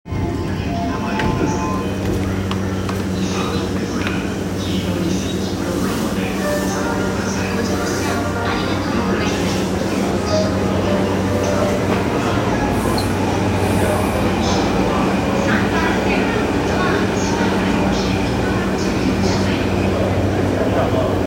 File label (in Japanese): JR 山手線 西日暮里 CCW, train sounds